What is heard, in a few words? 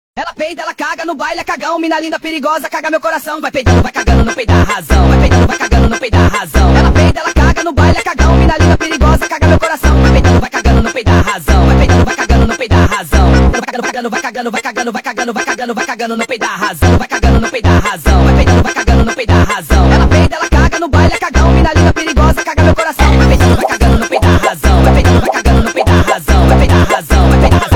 Sped Up